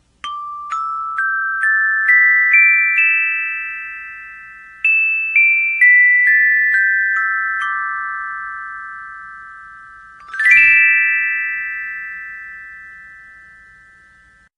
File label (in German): glocken